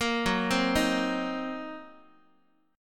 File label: Gb 6th Flat 5th Gb, Guitar; Gb6b5 arpeggiated